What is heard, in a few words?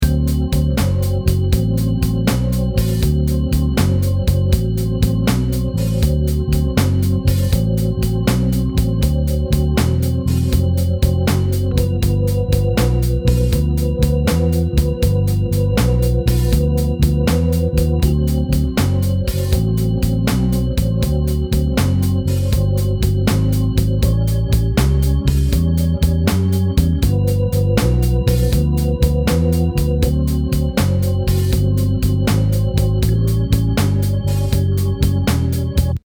12 Bar Blues Shuffle D